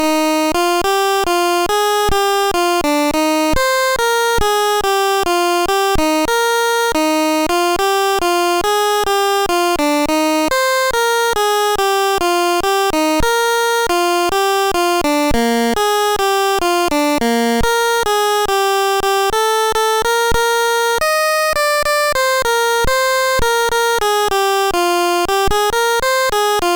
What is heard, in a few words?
Deutschlandlied 2 BASIC, MOS6581R3